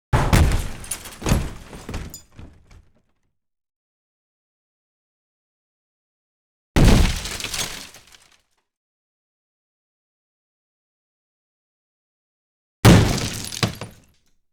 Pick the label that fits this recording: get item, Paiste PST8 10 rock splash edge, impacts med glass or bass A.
impacts med glass